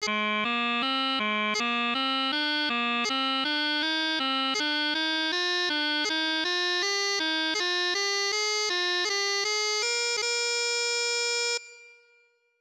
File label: Scale Exercise, piping